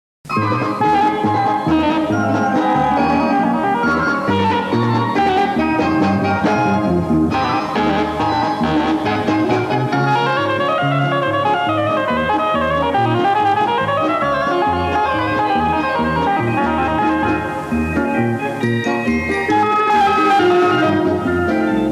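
polka, ansambl ehlektromuzykalnykh instrumentov, electronic music instruments ensemble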